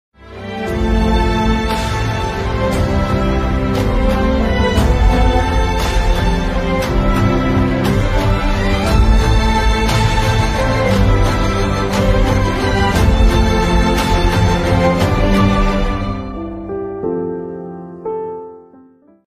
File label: ringtone malayalam ringtone; varshangalkku shesham